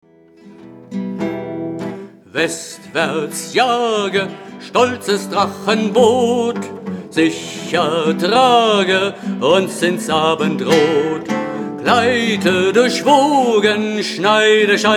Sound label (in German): solo; bjarnis lied